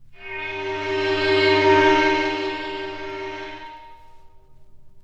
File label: Prehistoric Synth D#4; radiosonics